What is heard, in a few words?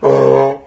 pz idle, poison zombie; svencoop; hl2